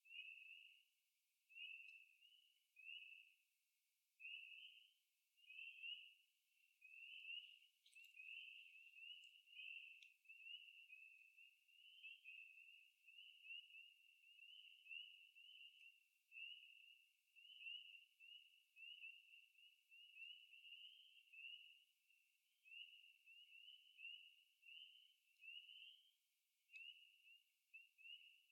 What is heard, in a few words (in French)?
Rainette crucifere; Go oiseaux Quebec